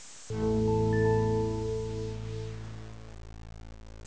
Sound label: splash 16000 8bit mono unsigned